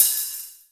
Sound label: HH THIN OP